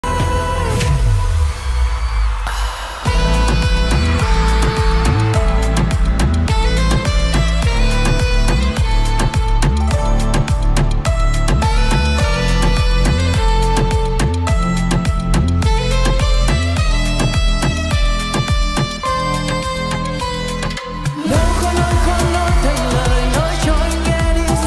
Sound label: saxophone; saxophone khong loi